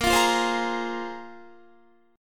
A sharp 7th Suspended 2nd, Guitar; A#7sus2; strummed; 17,20,17,17,20,18

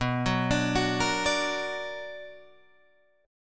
B Minor Major 7th, Guitar, Open G

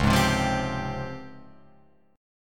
Db Major Sharp 11th, Guitar Open F; strummed

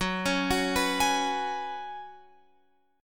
Gb Suspended 2nd Suspended 4th, Guitar Open F; arpeggiated

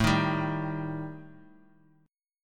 G Suspended 2nd Sharp 5th

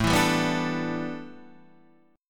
A 7th Suspended 2nd Suspended 4th Guitar Open D

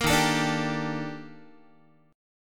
Eb Major Sharp 11th Eb Guitar; EbM#11; strummed

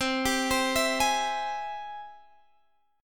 Ab Augmented Major 7th C, guitar

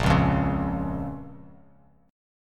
Bb Minor; strummed; JGuitar

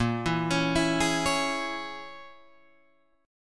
A sharp Minor 7th Flat 5th, down, Guitar, Open G

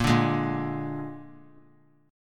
Bb 7th Bb down 3C,3F,3A,3D; strummed; JGuitar

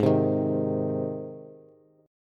G sharp Major G sharp Bass, chord; strummed; JGuitar

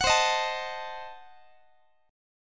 C Diminished 7th, Guitar, chord; Cdim7 strummed

